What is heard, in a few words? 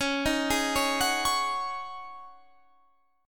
C sharp Suspended 2nd Suspended 4th, Guitar, Standard; arpeggiated; 21,18,18,18,19,21; capo=2